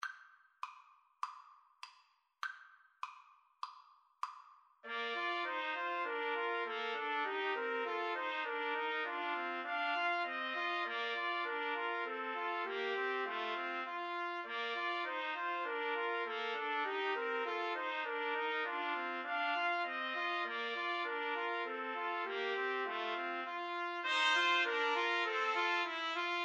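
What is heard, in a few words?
melody trumpet, karaoke; Trumpet Trio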